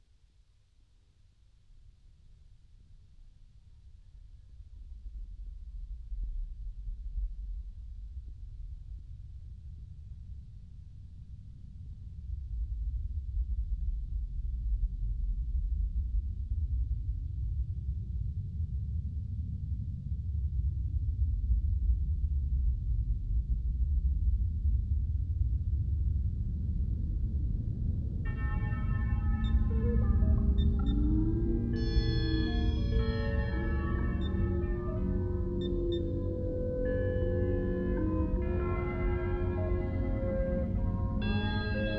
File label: Space Warp